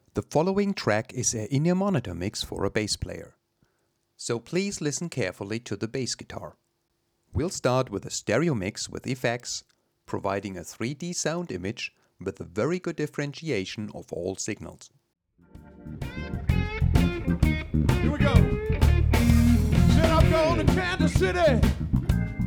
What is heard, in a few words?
Bass Mix